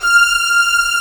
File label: STRING SECTION F5; STRING SECTION #1